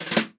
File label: brush; Northstar - New Gold VOL-2; 30S BRUSH